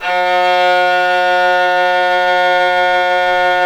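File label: SOLO VIOLIN, Synclavier Strings; ILIO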